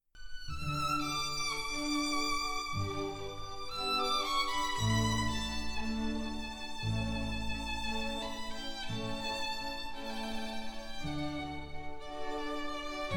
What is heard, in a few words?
Violin Con G